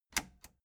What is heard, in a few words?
plastic button latch loose click enable slow